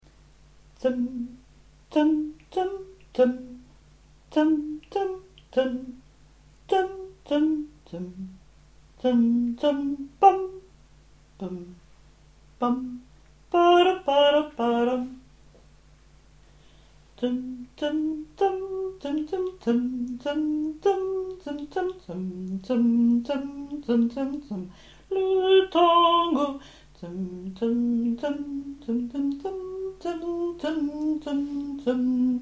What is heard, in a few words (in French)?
le tango corse basses